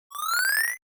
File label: Jingle Wave Start; Cake Invaders; PS4; Xbox Series X|S